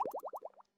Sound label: pop up notification sound